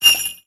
prepare bell; rayfa

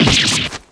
damage; dhurke

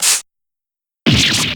Damage

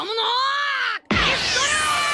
pistol; luffy

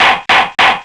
triple gavel fast

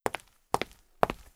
walk out; mai natsume

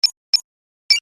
matrix op; athena

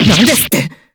damage; hibiki

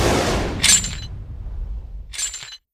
thought route end

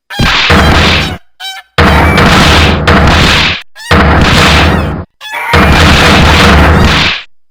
breakdown short; jezail

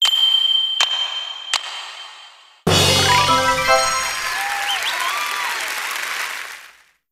puzzle win; plvspw

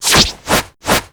charm reverse

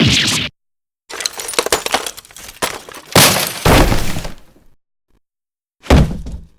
break; scuttlebutt

